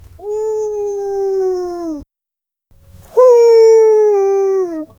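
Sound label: dog softly whining in pain; realism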